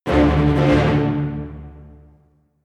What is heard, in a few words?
jingle brass hit roll out low